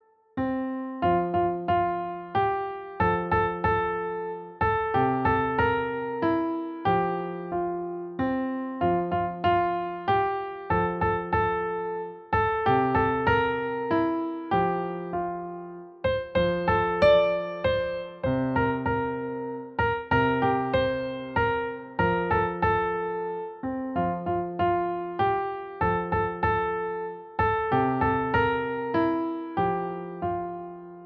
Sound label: o christmas tree, fortepian; 9 swiatecznych i zimowych melodii na fortepian cz II